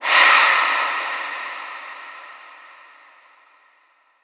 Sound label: Reverb Athmospheres, FX Vocals; Vengeance Samples